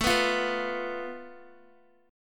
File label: A Suspended 2nd Sharp 5th, down, Guitar; Asus2#5; strummed; 4F,4A,5C,5G,5C,6E; JGuitar